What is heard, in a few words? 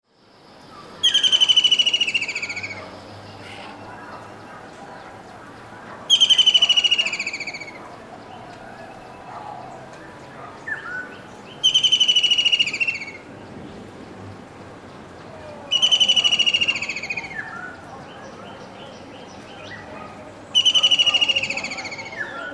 White throated Kingfisher song